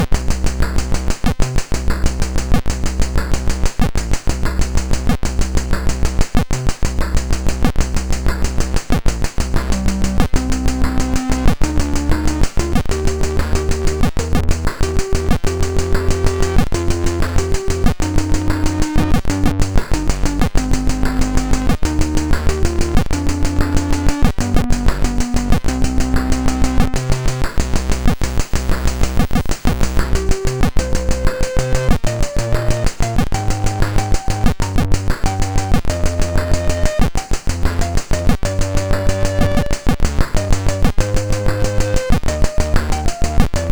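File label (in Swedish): MOS 6581 SID chip; MOS6581R3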